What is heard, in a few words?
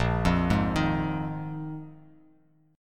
A sharp 7th Suspended 4th down; arpeggiated; JGuitar